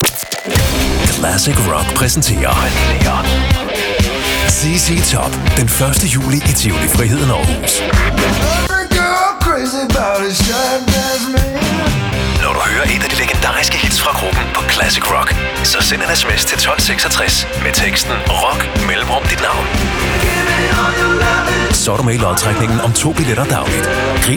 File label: Classic Rock Promo